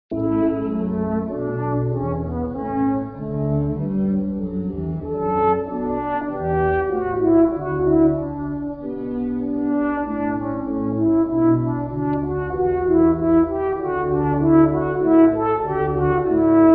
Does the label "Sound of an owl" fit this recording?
No